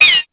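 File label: Dilo Call, dinosaur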